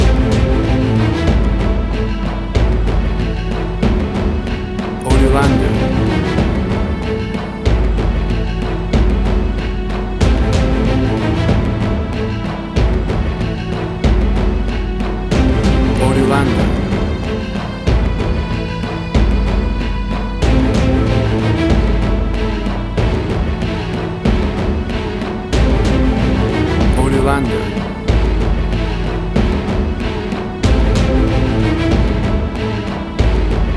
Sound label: Big Action Trailer Music